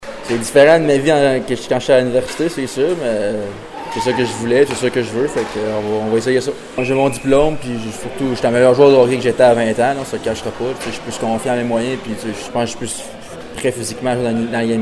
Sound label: CLAP